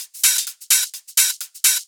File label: Hihat Loop 128 BPM; House; Best Service; House Definition VOL-1